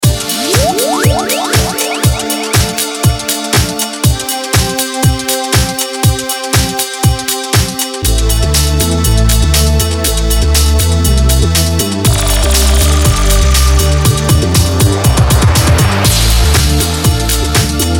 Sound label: Bassline House; Electronic Chilled